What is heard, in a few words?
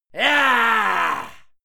male fight scream yah; schreie; menschen; soundpool